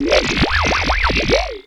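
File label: FX left, attacks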